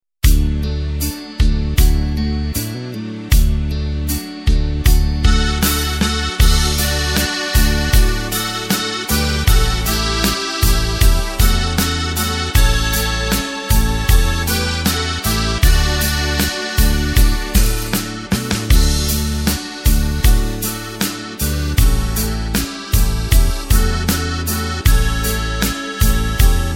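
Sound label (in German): Jodler Playback; midifile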